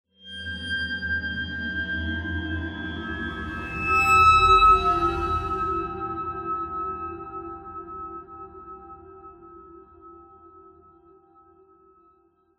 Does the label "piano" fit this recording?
No